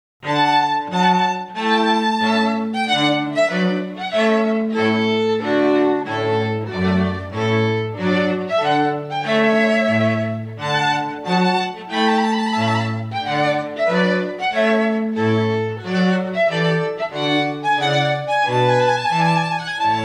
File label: trumpet tune